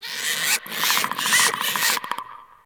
Venus Landscape Close Critter; Warframe; Venus Open World; Ambience

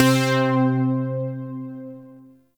Shawzin Oct Two C; Music; Warframe; Zariman

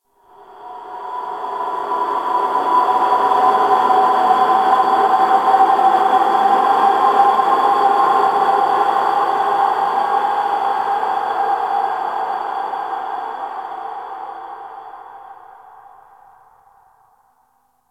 Ice Cave Wind; Warframe; Corpus Ice Planet; Ambience

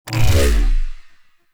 UI Theme Close; Corpus

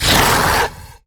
Pain, Barks; Warframe; MiTW

